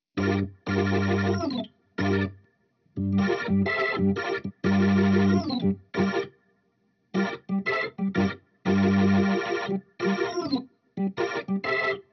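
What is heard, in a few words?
amp wet organ, Hybrid neural guitar overdrive; Morphdrive; Demos for hybrid configurations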